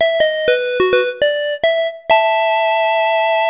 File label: Bonus Level Complete; Exception